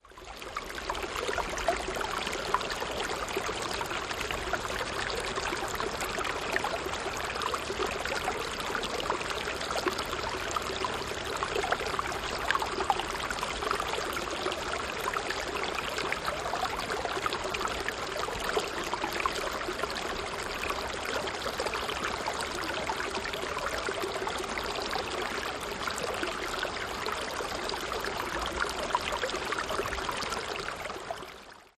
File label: Med Trickling Stereo, streams